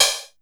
hat, drum; Masterbits - Total Zone

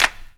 CLAP, Dance Drums

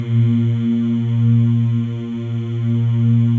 MALE MMS, Symphony of Voices; Spectrasonics

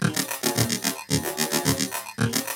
LOOP, TECHNO; TECHNO-BOX; ELEC-LOOPS

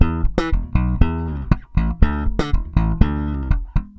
SA BS EM, BASS SALSA

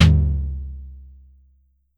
Drum X Tom, Pearl

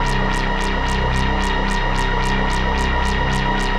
Mini LFO SFX; SFX & One-Shots

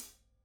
Soft Gentle A, Hats; Airwindows

